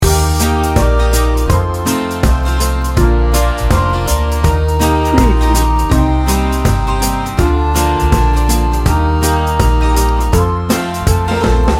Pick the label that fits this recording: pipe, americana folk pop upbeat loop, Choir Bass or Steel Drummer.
americana folk pop upbeat loop